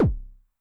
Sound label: BD MOD [Pitch+Depth], 909mod, BASS DRUM; classic machines